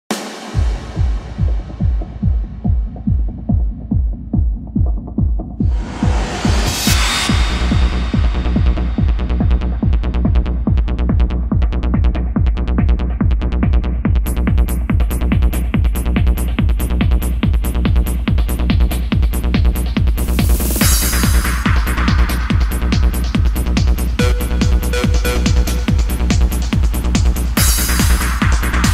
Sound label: extended instrumental club mix